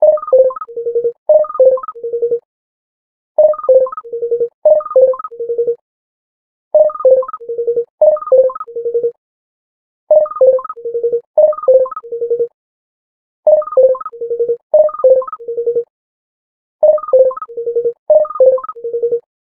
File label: 3D Tone; SKY; IM-T100K VEGA No.5; sky ringtone